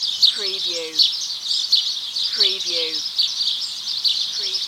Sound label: Birds Loop